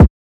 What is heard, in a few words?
Kick; The Lunch77 Sound Design Mini Kit (Vol. 1)